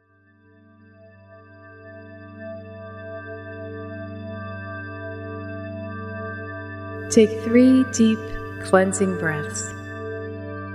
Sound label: Cleansing Breath